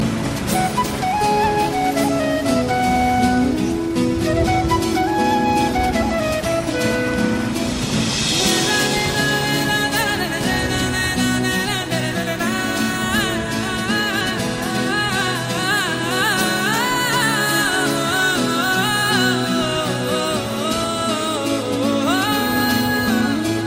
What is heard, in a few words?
Awesome Flute Mixing